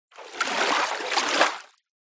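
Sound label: nature water movement splash processed for stereo width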